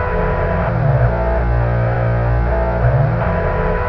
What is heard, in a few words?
odd loop; svencoop; nlab